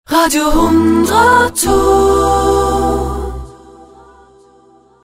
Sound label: jingle radio